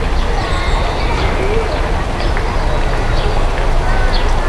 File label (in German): atmo dach; Singles; French Version